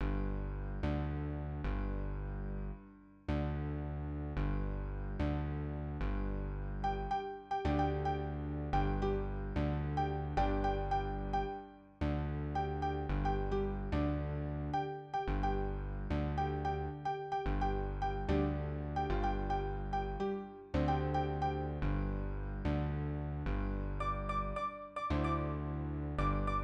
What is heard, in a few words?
trio